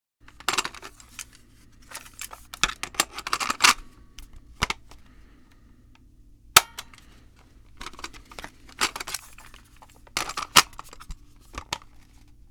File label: Cassette tape handling rattle close perspective